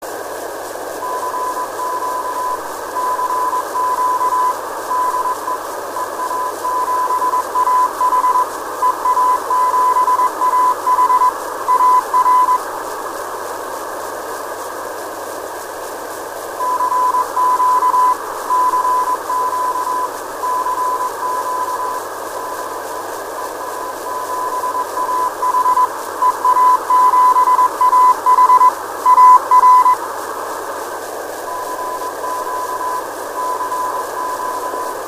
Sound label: BEACON 50099 KHz